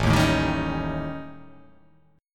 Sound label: A 7th Flat 5th